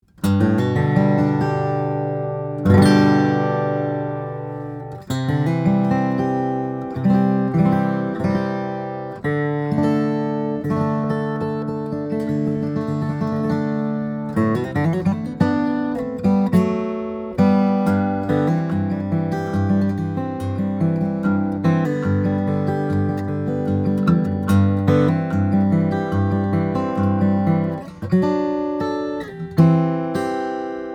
guitars, steel-string guitars, flattop; mcalister; flamed maple; german spruce

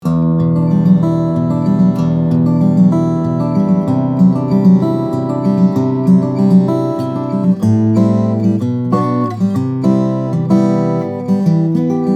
mg-35, steel string guitar flattop; mcpherson mg-3.5

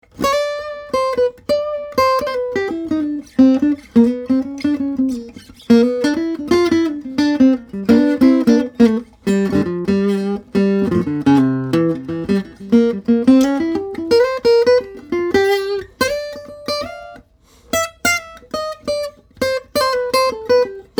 guitars; YL Cholet Jazz; indian rosewood; bearclaw spruce; acoustic

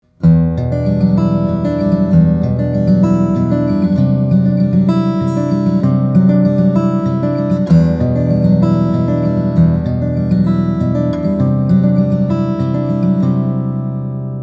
petros applecreek gc, East Indian/Engelmann; steel-string guitars; flattop; Bruce Petros

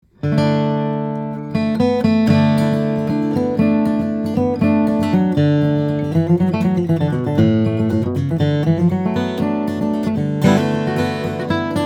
Classic D, guitars, steel-string guitars, flattop; dudenbostel; brazilian rosewood carpathian spruce

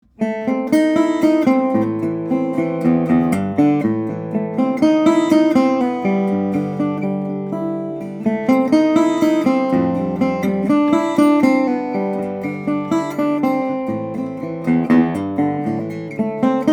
Resonator, guitars, electric guitars, semi-hollow; aluminum body; mercury hubcap cover; Pogreba Brown Mercury Resonator

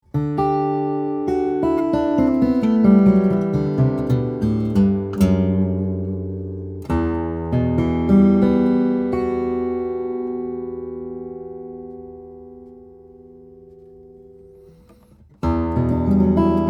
Jumbo, guitars, steel-string guitars, flattop; wenge western red cedar; Gaffney

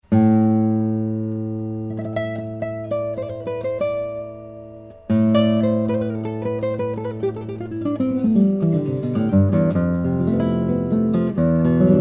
velazquez torres; don manuel; classical

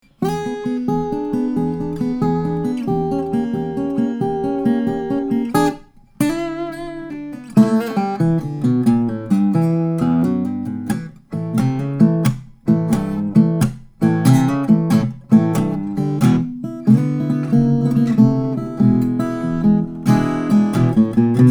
lj10-cm little jane, steel string guitar; furch

